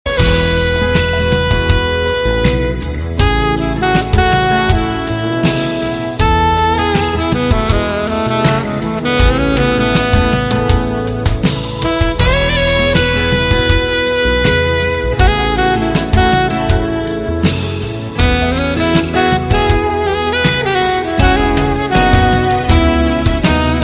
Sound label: bad sax flut